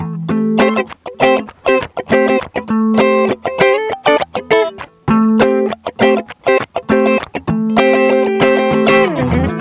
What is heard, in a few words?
Guitar Funk Riff Am